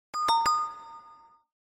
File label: Huawei Nova 12 Ultra SMS